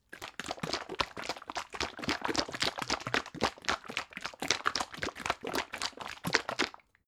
bottle syrup shake slow, gastronomie

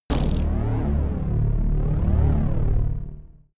shot wavy darkness, pulsieren

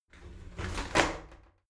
shower slide doors open regular, tueren

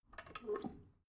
drain valve close soft, Badezimmer; haus garten

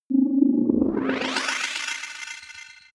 fighting racer warp, raumschiff

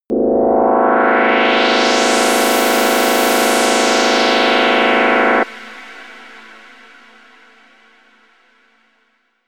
rocket turbine fire power, raumschiff

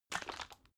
bottle syrup shake single viscous, gastronomie

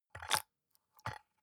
soap dispenser press squish, badezimmer

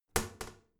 toilet lid wall open regular, badezimmer

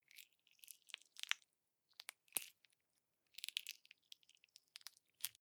gel dispenser press squish long, badezimmer

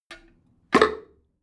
drain valve open heavy, Badezimmer; haus garten

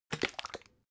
bottle syrup shake single, gastronomie

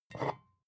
bottle vodka pick up slide, gastronomie

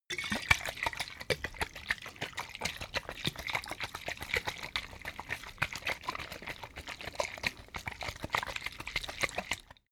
bottle vodka shake, gastronomie

